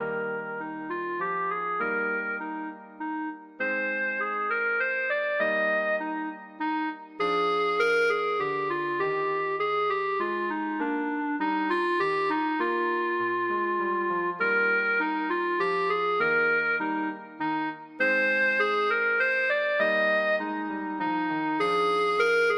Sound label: minuet, clarinet; bach